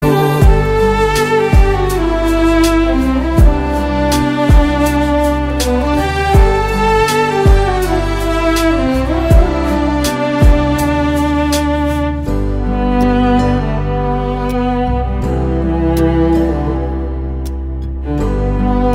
Instrumental

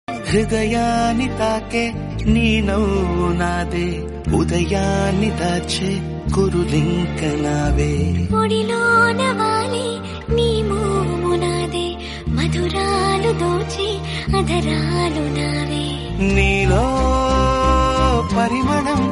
Male Version Ringtone; Policeodu; Telugu

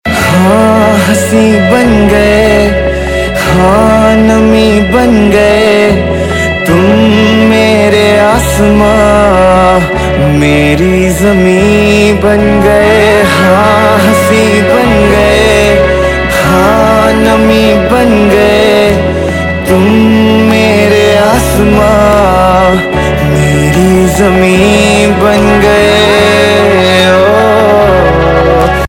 Male Version, Hindi

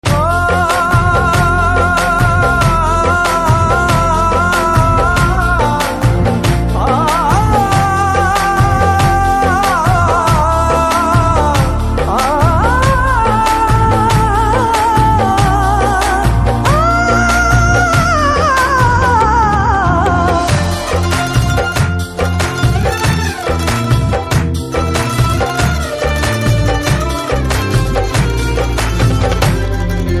BG Humming Ringtone; Uppena; Telugu

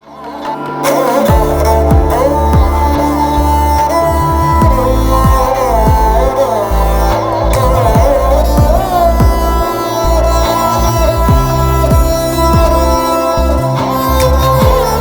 Instrumental

